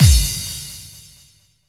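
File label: bass drum FX, dance drums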